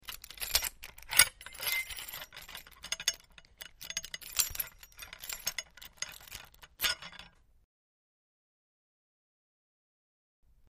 Putting On A Steering Wheel Lock Movement With Key Jingles; The Club